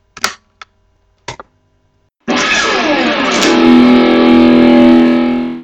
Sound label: Guitar Plug